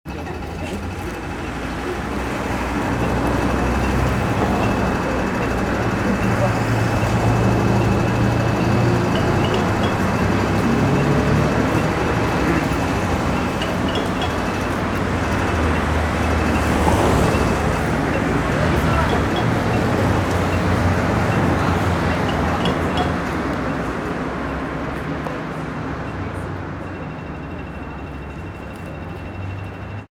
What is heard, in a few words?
city noise intersection, street traffic; best service Blue Box